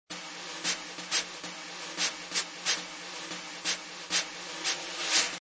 Jazz Brushes 180, Jazz drum brushes loop at 180 bpm; Royalty Free Drum Loops